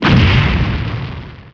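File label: weapon hit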